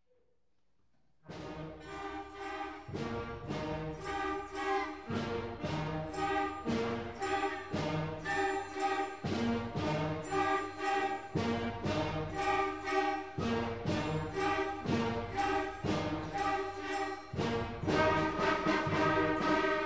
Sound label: military march; Instant Success Music for First Year Band and Strings